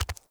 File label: Walk Default, Footsteps; Enemy; Mockeries; Mockery